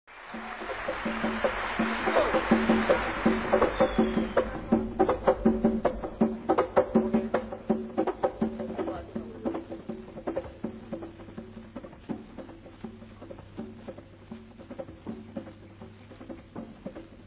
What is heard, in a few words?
Afro Cuban Drum Suite